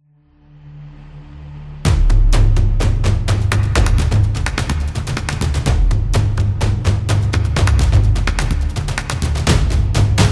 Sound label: African Drums; battle drums